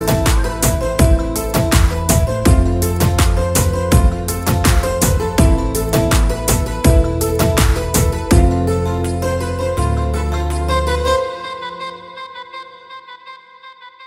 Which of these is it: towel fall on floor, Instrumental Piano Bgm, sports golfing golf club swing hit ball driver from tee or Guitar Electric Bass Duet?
Instrumental Piano Bgm